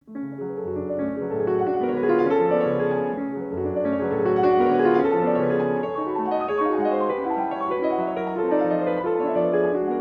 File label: F sharp minor Molto; Chopin; Preludes; Fis-Moll